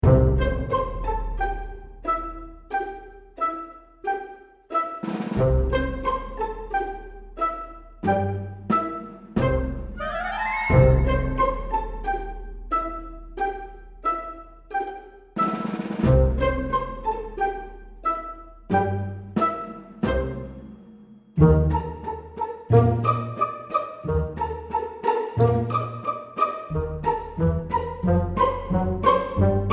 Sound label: Comedy: Whimsical; film-scoring